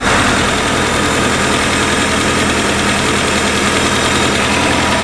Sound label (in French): moteur